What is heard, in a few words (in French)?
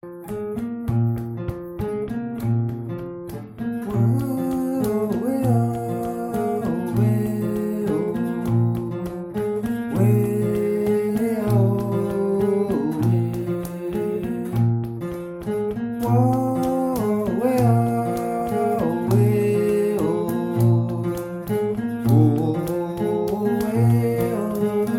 chant; 2019.02 WE Courcelles Sur Aujon